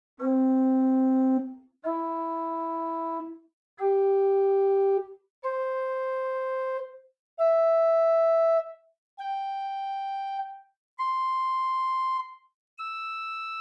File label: Prinzipal 2 arp, organ; Manual II; University of Illinois, USA; Buzard, 1986; Buzard Opus 3